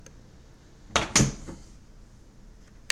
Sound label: Door Open